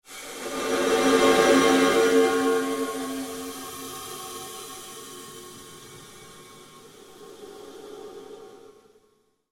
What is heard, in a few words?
SURGE FADE, Sound effects created by manipulating other sounds; Rises and Dives